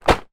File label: leisure game sheet folded drop down on surface; twister; zapsplat